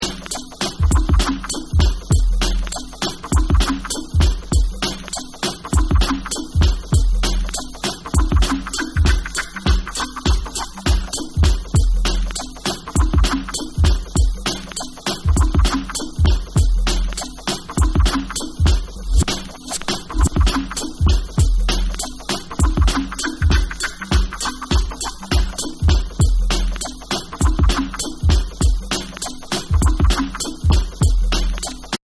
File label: Instrumental